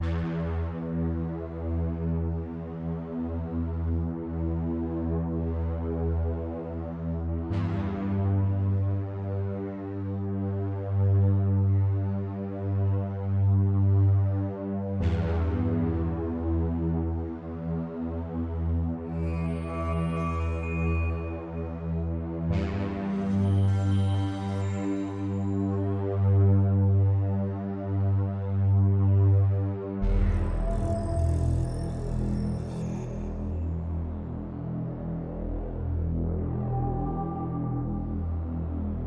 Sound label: Drone, Ambient